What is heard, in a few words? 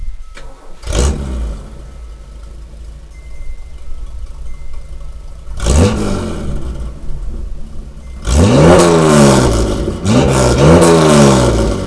aftermarket motor